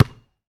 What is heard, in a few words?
Street Ball Bounce Asphalt; SFX Kit; Street Basketball